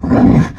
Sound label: pain